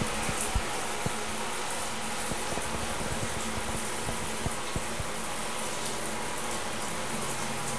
Rain On Tin Roof; Weather

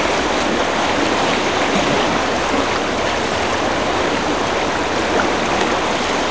Water Ful Soft

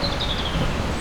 Bird Chirp; Birds

